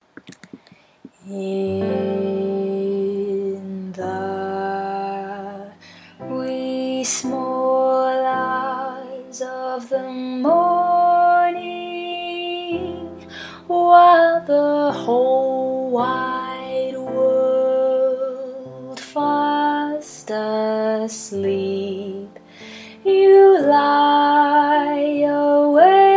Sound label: Bass